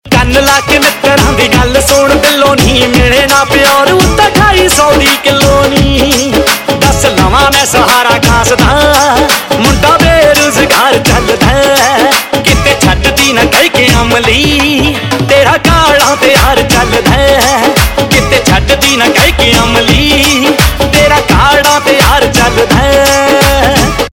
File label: Ringtone, Punjabi